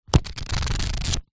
household book cub scouts record small flick through pages; zapsplat